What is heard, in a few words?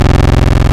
Modular 3 VCO Pulse, wavetables; Animoog